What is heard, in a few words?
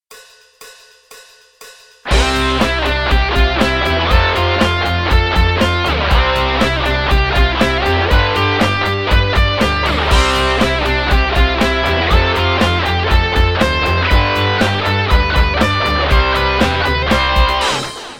Drum Kit Room Kit